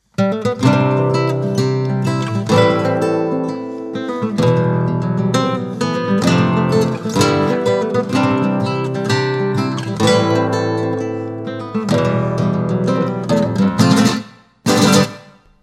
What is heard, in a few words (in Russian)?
Gitara, rington